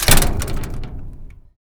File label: metal door close